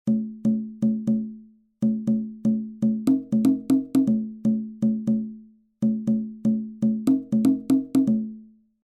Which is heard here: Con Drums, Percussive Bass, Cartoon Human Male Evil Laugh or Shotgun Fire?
Con Drums